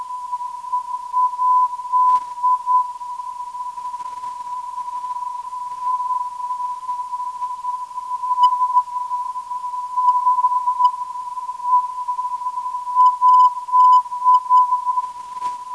387.94kHz, ndb

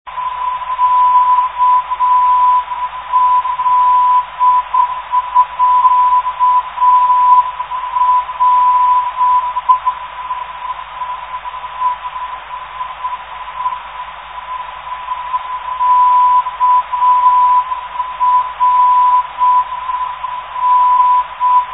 kr 840khz, NDB

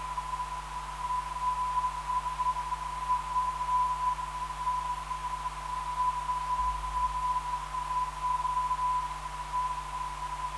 LUE 427kHz

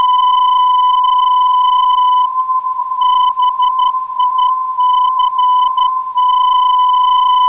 405kHz, ndb

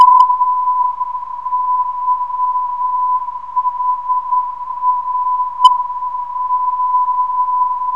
yhr 276khz, ndb

